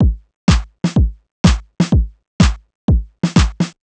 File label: drum loop bust stripped 125, Classic Chicago House; Sample Magic; Drum Loops